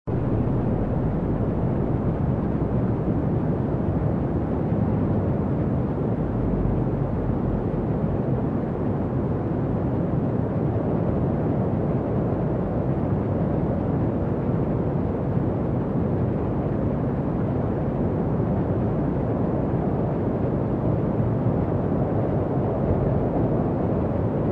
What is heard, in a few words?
Amb Building Inner Int